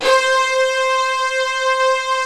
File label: SYNTH ORCH